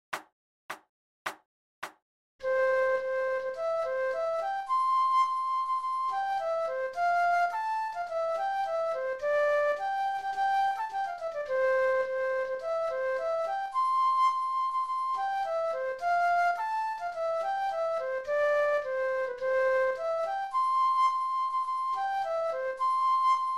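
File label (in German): Floete, 106 bpm; Edinburgh Tattoo; Scotland the Brave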